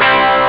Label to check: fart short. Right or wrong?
Wrong